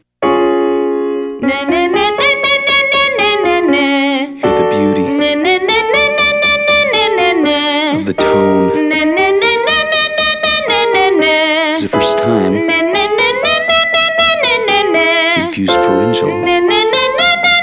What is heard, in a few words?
Demo Female; Singing